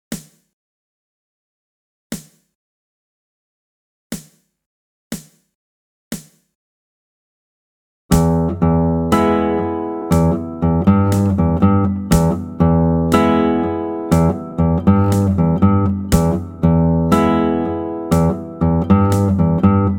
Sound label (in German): e dur riff langsam